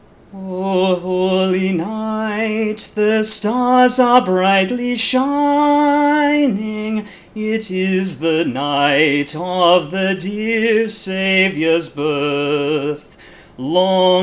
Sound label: voice